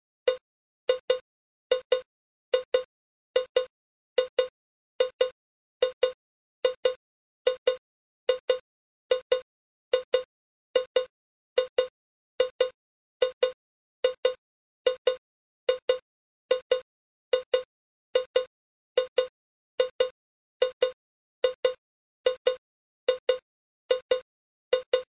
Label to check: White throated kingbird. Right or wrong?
Wrong